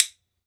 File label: WOOD BONE, Celtic Percussion; Drums&Percussion; E-MU Producer Series Vol. 7 – Old World Instruments